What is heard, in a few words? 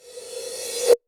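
TR909 Crash Reverse, Drumset; Roland SH-32; Drumset 04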